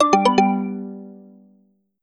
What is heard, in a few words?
notif